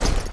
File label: damage act, fire knight